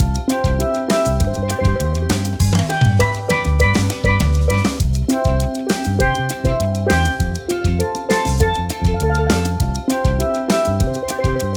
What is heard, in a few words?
Steel Drum Jamaican Groove Beach Band 100 bpm